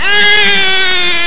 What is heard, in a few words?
CRY